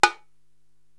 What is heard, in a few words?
Bongo Hi Stick, Korg TR-Rack